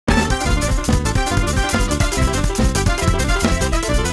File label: Jazz, WSOLA; TSMtoolbox